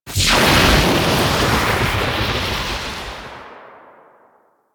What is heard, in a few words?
Tengu turbulence cast; Warframe